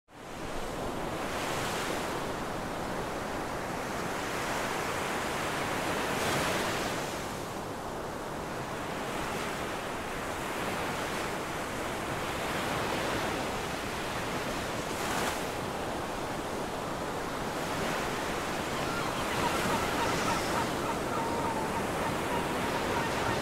Golden Sunrise on the Beach Relaxing Ocean Waves Sounds and Seagulls No Music